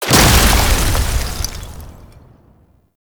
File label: Grenade Explosion Cold; Warframe; Entrati; Alchemy